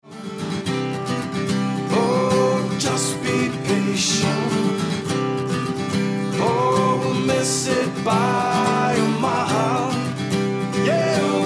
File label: Acoustic